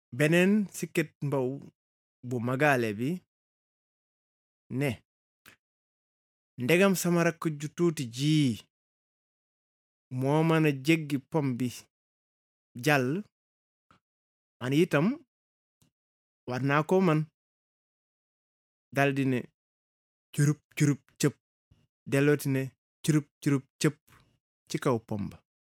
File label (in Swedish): bip